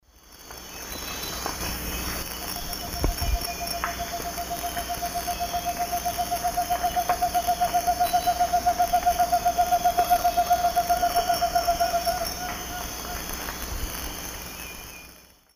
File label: Megascops watsonii, song; S. America; Eastern Lowlands